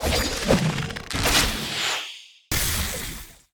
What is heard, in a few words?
enemy attack puddle cast; bloom minigame